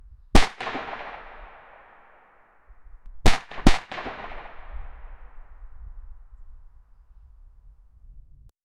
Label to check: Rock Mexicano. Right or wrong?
Wrong